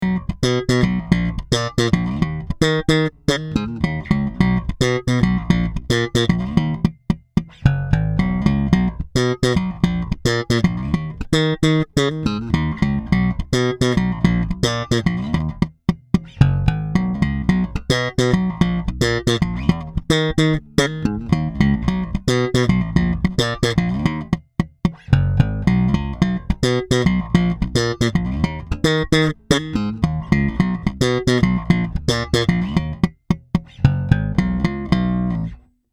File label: Did M+C Slap Actif, basses